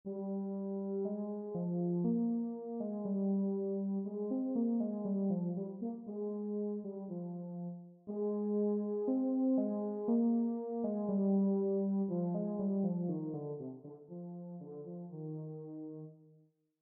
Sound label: baritone etude